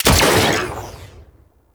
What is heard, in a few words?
Freeze Ray Shot; Warframe; Venus; Venus Shockwave Spaceman Agent